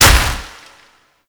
Proc Explosion; Warframe; Mods

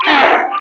Enemy Pain; Warframe; Lasrian; Battle Barks

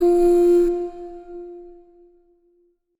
Mother Hum F; Player Instrument; New Player Mother

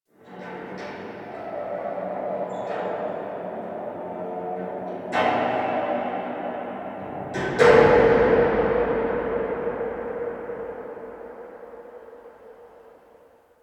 Parking Lot Distant Metal Bang; Warframe; Ambience; Nineteen Ninety Nine

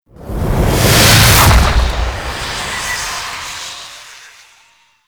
Fly By Slow, Vehicles Flight; Warframe; Liset; Stalker Liset Skin

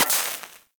Laser Impact Metal; Warframe

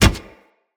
Kubrow Run Metal, Footsteps

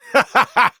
Power Cast; Warframe; Entrati Lab Quest; 2023 TennoCon; Arthur

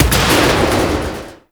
Gas City Fan Explosion; Warframe; Corpus Gas City; Gameplay Remaster; Ambience

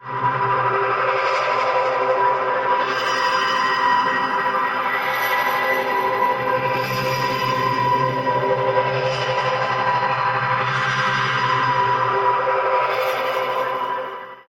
Gem SFX; Cinematics; Lotus Gem Part One

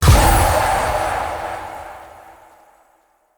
rage impact; Powers; Fey; Champion

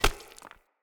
Unarmed Punch Impact Rock; Weapon Impacts; Player; Environment

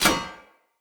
Great Sword Recall Catch; Weapons; Swords; Dendrit Great Sword

